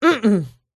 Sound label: disagree answer stupid; lukas tvrdon